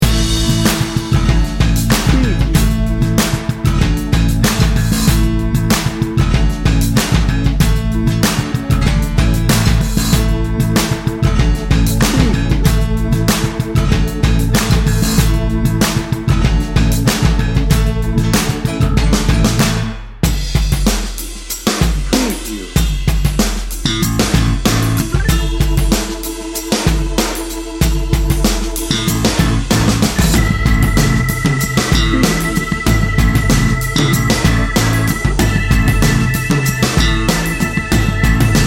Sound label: memphis soul no guitar no horns